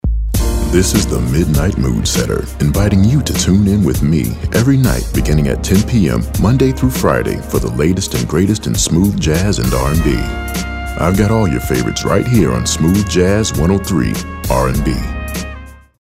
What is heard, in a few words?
Smooth Jazz